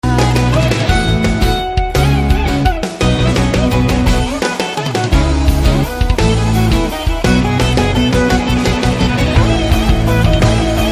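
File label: BGM Instrumental Ringtone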